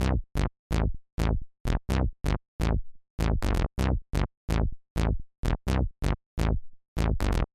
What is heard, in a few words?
synth bass dynamik